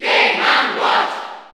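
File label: Cheer Spanish, crowd; Mr. Game & Watch; SSB4; SSBU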